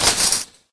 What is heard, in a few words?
metal hit, wires; hl2; d1 terminal 01